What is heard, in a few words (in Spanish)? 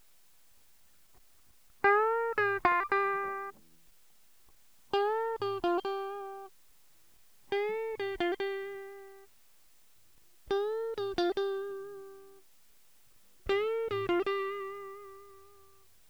5 pastillas, Guitarra